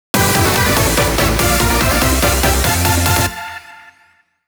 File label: Boss Clear; Super Bike Rider